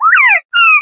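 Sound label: ringing cry of American partridge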